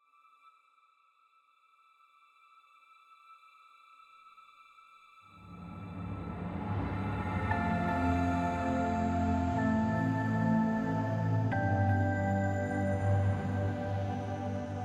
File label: Mountain Stinger Mountain Peak; Mountain Music